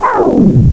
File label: pain; DOOM